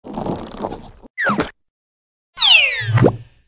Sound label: teleport; open toontown resources